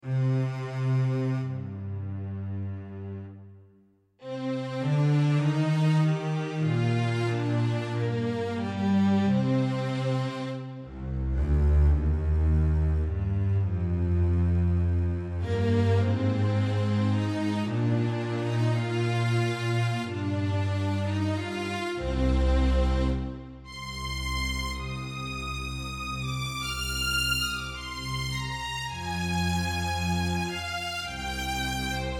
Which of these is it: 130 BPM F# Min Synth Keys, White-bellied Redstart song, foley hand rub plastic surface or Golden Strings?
Golden Strings